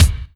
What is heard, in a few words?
Yamaha RM50 Dance Kick